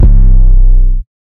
xtreme bass effect

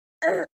male player hurt sound; terraria

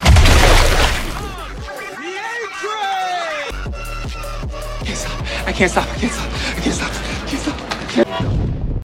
a train splatter

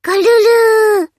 message

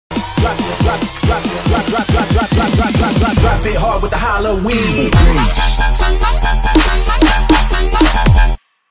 second bass drop

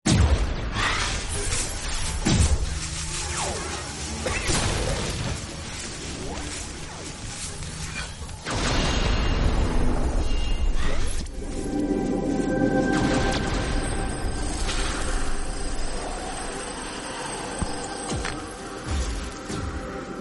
dramatic sound effect

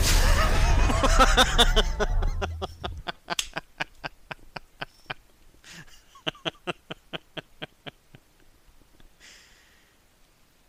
laughing

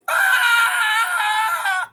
screams

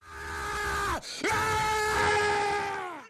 yell; alex jones

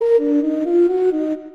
death sound; twinsanity java

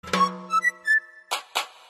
woohoo

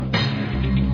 piano

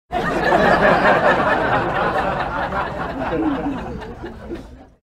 crowd laughing

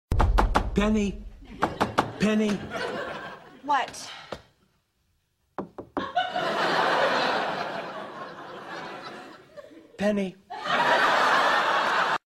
double penny knock

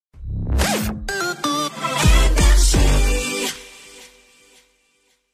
jingle; nrj 2016

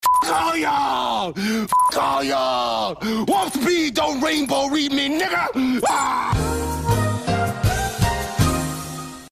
warp speed

